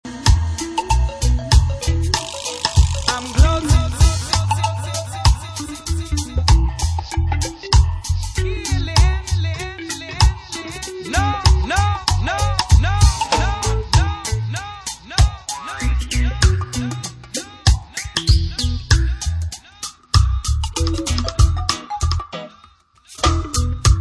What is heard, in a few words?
dub